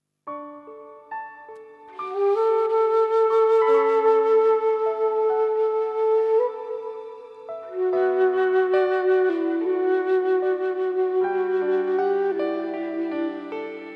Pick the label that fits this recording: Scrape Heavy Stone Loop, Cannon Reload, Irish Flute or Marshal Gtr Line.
Irish Flute